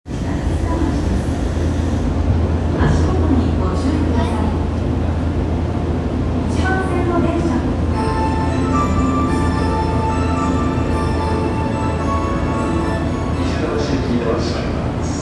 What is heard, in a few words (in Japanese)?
東西線南砂町東, train sounds